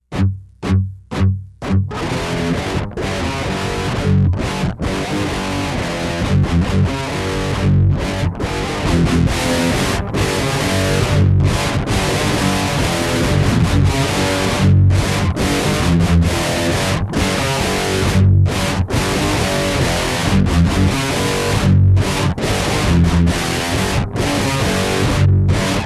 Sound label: Riff Tapes